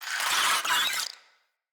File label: Infested Ashen Vocal Attack